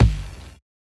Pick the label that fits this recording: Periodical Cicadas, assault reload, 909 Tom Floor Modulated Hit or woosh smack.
909 Tom Floor Modulated Hit